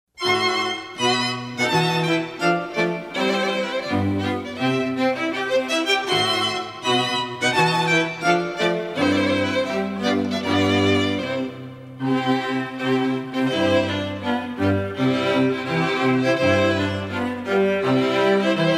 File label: Wedding March, Classical String Quartet